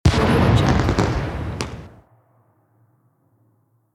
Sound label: firework small